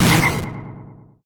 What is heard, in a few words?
smash